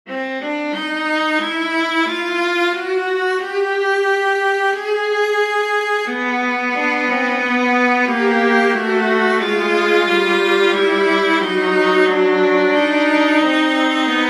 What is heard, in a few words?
canon for viola duet; bach johann sebastian; from the musical offering